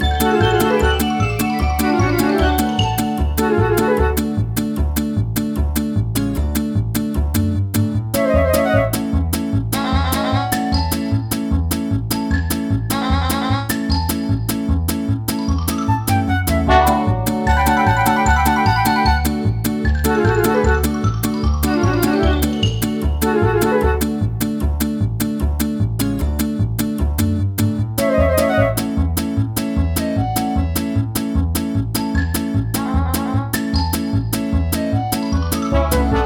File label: Instrumental